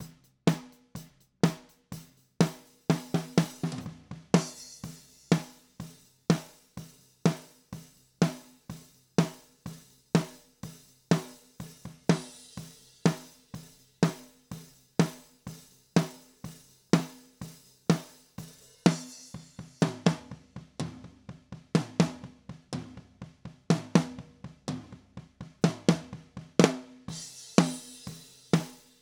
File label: Snare; TubeTech